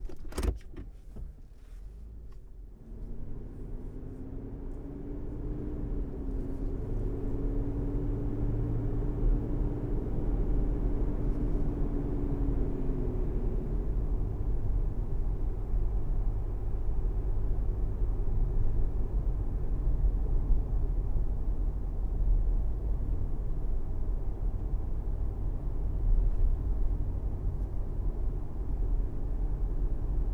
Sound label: Car Interior Start Steady 20MPH; Vehicles